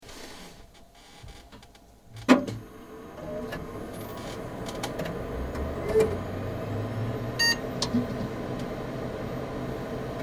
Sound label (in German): PC Start